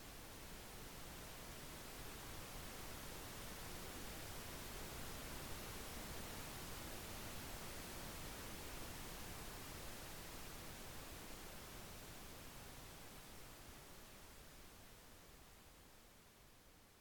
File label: oscilating pink noise